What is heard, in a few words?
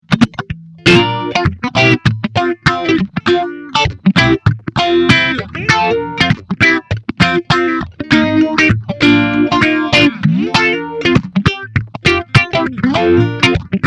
Phase Clean Modern